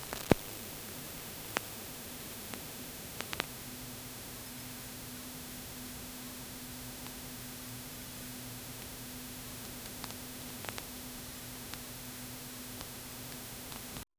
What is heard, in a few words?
vhs tape is put on; vintage